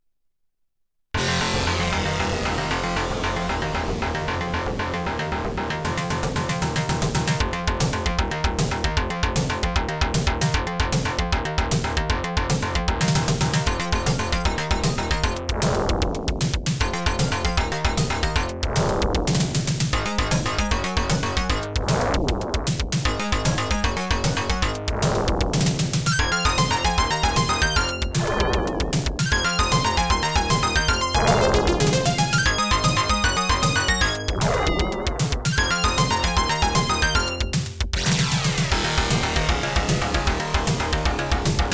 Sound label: OPL3